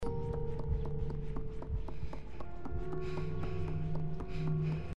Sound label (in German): rennen; Little Nightmares; gamesoundopedia